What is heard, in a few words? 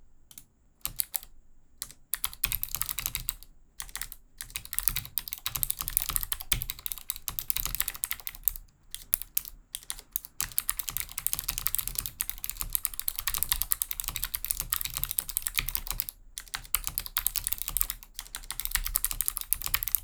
vissles lp85 typing